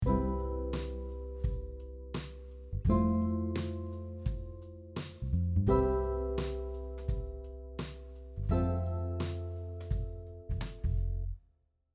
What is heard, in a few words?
Drop 2 chord, guitar; how to play drop 2 chords on guitar